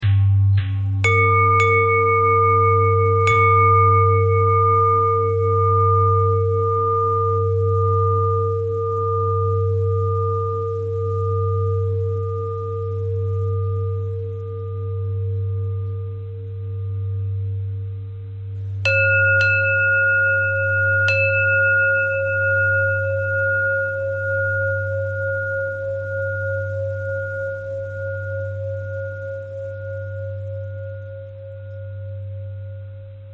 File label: bell threads